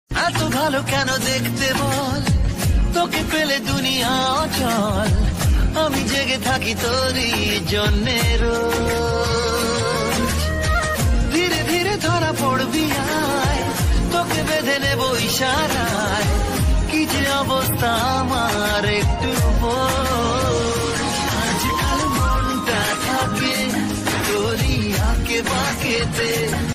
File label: Sad Ringtone